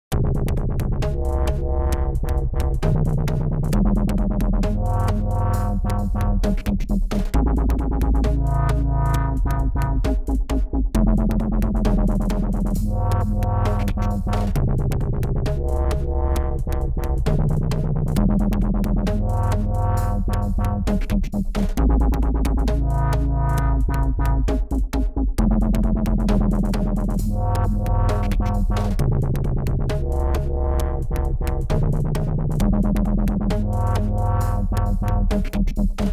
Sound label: Wobble; Agonizer iOS